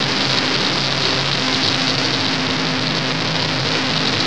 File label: Static; Sonic Foundry (Sony Creative Software); Myths of Technology